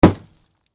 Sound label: Snowball Impact Hit; Snowball Impact Wood Hit; Ice (Sound Effects:Weather); Blastwave